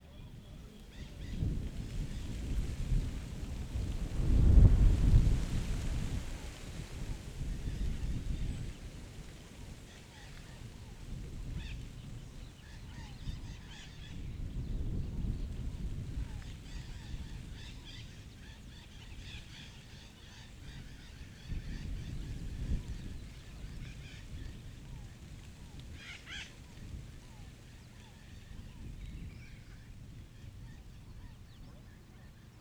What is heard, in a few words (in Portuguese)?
Vento em ambiente rural de manha com passaros e pessoas longe